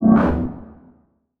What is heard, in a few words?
Transition Whoosh Short; Production Element